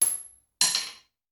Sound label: Bullet Shell; Sound Ideas; Guns